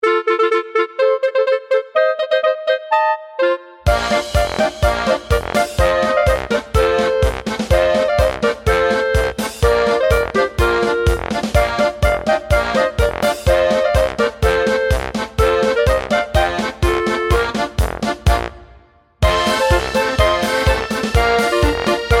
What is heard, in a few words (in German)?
Volks Musik